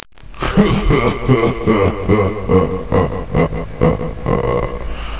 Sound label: HA HA; Sirens of the Pleasure Dome